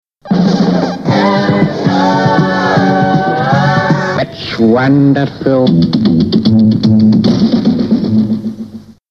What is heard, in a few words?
Jingles